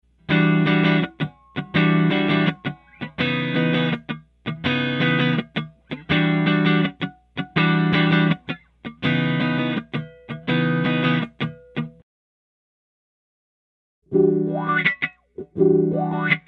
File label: wah modern